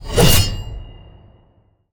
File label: katana magic